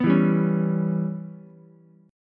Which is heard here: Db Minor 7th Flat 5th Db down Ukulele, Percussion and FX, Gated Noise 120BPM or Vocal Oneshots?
Db Minor 7th Flat 5th Db down Ukulele